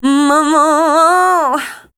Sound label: RHY LINE, Voice Spectral; RHYTM